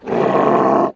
roar; The Elder Scrolls III - Morrowind

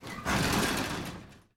shutter drop; Papers Please

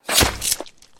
Cleric Scimitar Hit; SyrinScape; Missing Magistrate

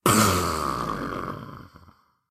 splosions; SyrinScape; Syrinscape unplugged; Jungleplanet dinolaser battle

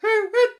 bird; Syrinscape unplugged; Jungleplanet dinolaser battle

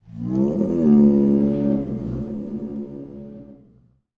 howl; The Elder Scrolls III - Morrowind

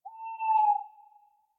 Tawny Owl; SyrinScape

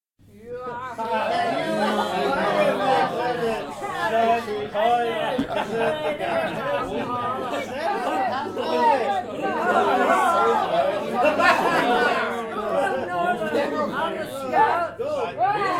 Raucous tavern; SyrinScape; Waterdeep

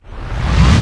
cast; The Elder Scrolls III - Morrowind; Morrowind GOTY GOG

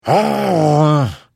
Hit, Vo

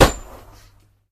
Axe Blow On Chainmail; SyrinScape; Iris hill manor

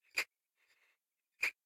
Rat Squeak; SyrinScape

